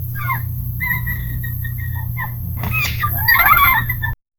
kids laughing faintly

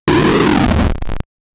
8 bit sound of the

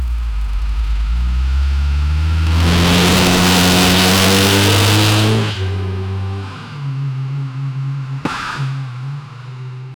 a realistic sports car do; sports cars

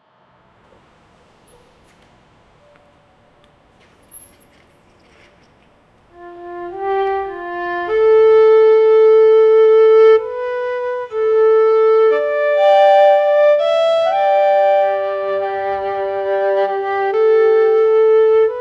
a sad violin melody; instrumental

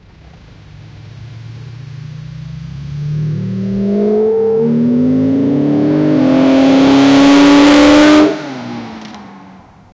not too loud exhaust sound, sports car

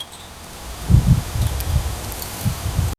gentle wind sounds leave, bird chirps

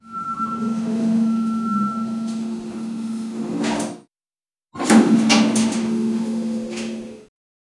old elevator sounds; building

